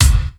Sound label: bass drum, Drum & Bass, 160 BPM; Ueberschall; Drum & Bass Resonance